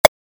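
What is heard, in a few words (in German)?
Menü schließen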